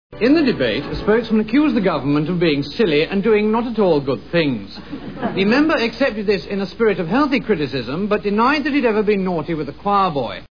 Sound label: choirboy